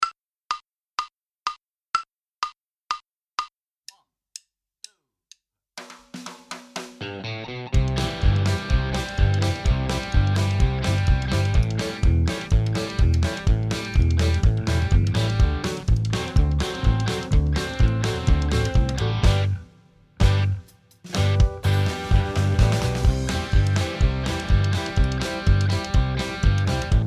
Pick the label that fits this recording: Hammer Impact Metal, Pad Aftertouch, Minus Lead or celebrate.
Minus Lead